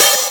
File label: Open Hihat